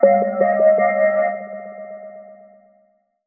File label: Message sound